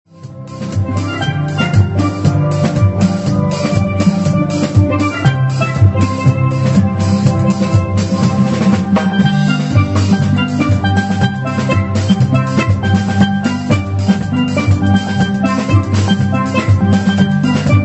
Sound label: Conga Line